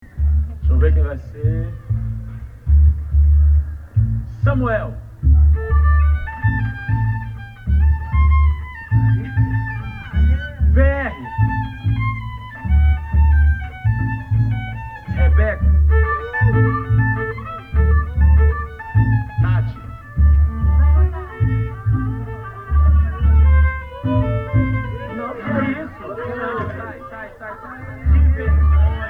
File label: Street Orchestra